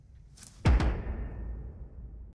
Knock knock

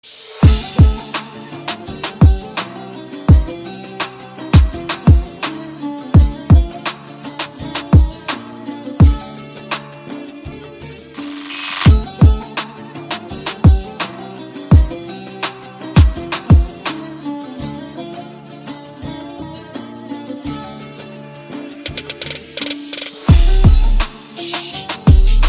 Turkish Saz

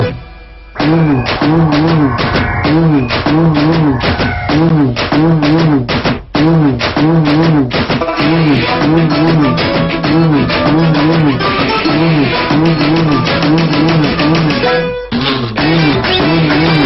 Trailer Music

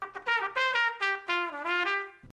Trumpet Notification

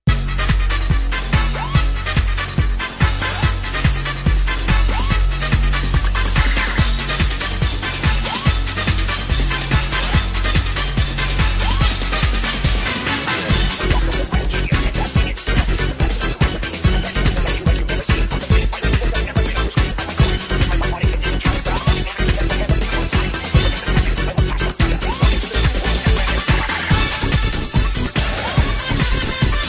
Metallic Rave

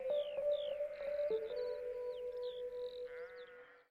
Sheep

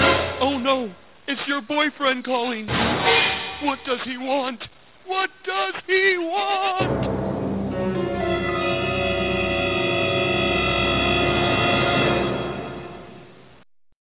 boyfriend calling

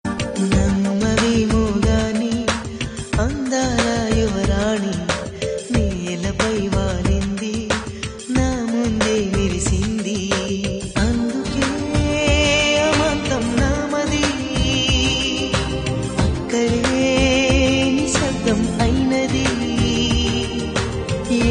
Romantic Song

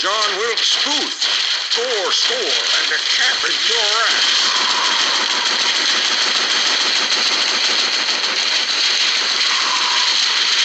ghost; Kingfalls

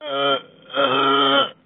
moan

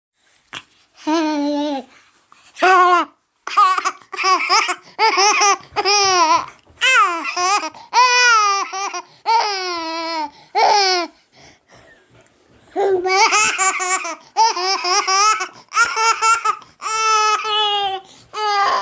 Baby laughing

